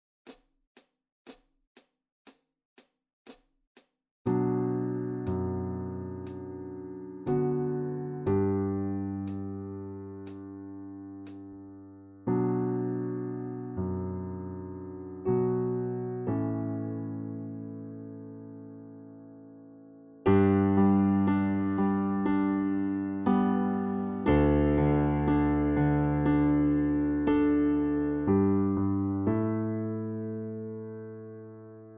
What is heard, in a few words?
duo for flute and piano, piano with click intro